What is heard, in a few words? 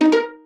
jingles pizzicato; Kenney; Music Jingles